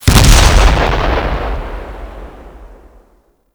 Swarm Missile Explosion; Warframe; Swarm Missile Launcher

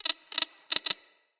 Ext Insect; Midrath Island

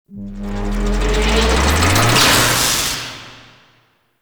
Spawn Machine Electricity Zap; Ambience; Gameplay; Int Echoes; Warframe; Entrati

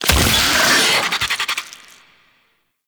Colonist Rescue Spawn Pod; Warframe

